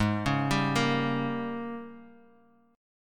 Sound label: G sharp Major 7th Suspended 2nd Suspended 4th Guitar Half Step Down